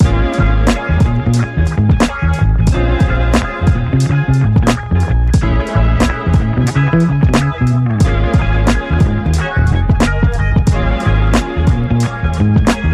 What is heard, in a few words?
Sad Swinger Rhythm